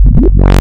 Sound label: FM64 Wave opsix; logue-sdk